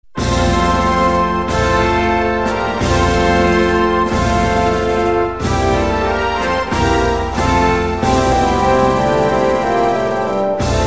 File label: music